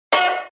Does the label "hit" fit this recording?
Yes